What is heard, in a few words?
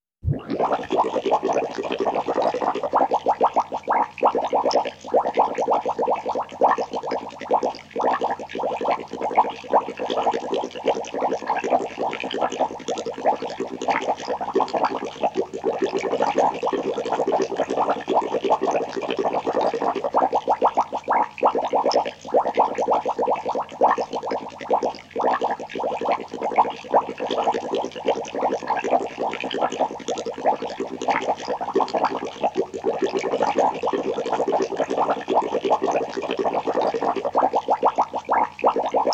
Bubbles in big tank